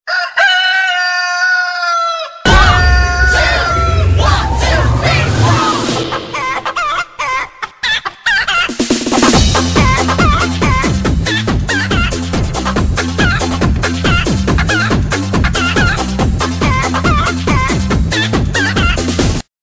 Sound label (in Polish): Pianie koguta Remix